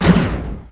CRUNCH; CD Action; shadow

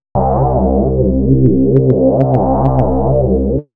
droner, Synth

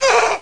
Flagship Bad Timing; Swoop 1.0.2

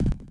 Landing

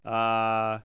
AHH; bloopers

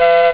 beep, rave

